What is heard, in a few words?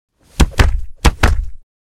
Kick-Block Combo; Hollywood Edge Martial Arts and Human Impacts